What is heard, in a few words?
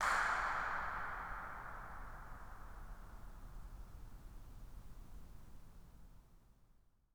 incinerator dirac